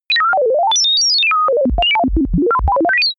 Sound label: chaos sine